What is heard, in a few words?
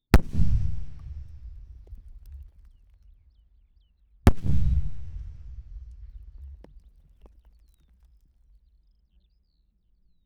Claymore Mines 20m; SD702T; Brecon 2015; WEAPONS RECORDING PRIMER